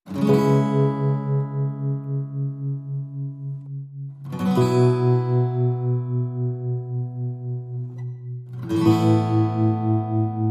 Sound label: Calm And Serene, No Drums